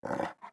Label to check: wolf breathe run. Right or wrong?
Right